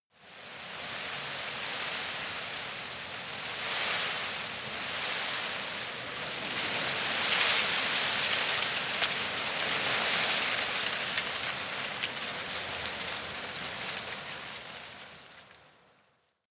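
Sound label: tree leaves rustle soft, garten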